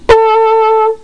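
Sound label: flugelhorn, notes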